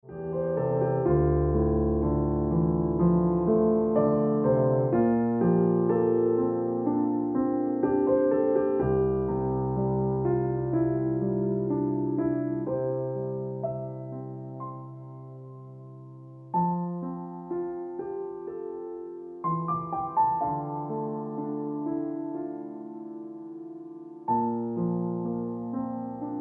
Piano Music

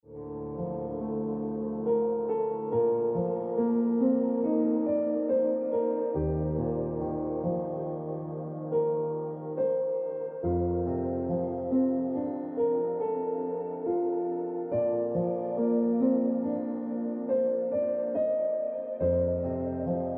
Tonic Note Piano Music

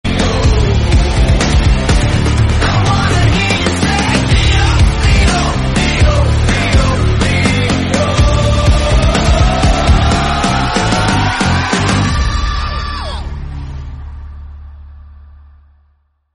Bgm, ringtone; Vijay Leo Movie

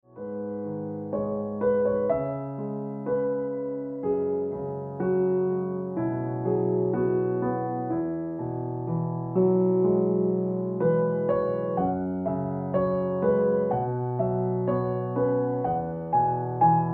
Ringtone Piano Music

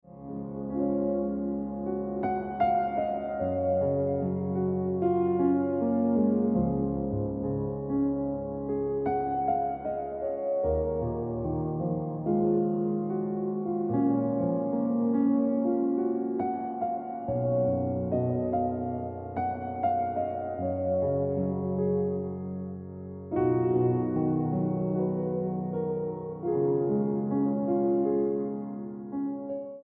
Piano Music

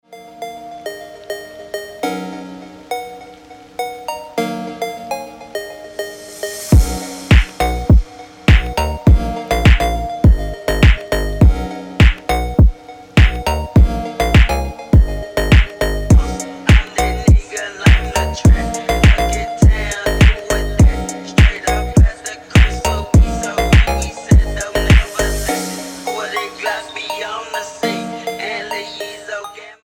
Slowed; Ringtone

